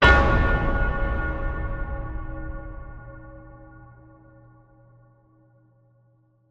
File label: death bell slow; gang beasts